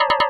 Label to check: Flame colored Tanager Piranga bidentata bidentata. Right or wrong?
Wrong